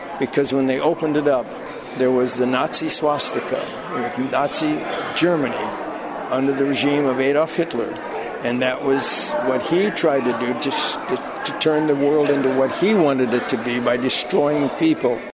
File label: violin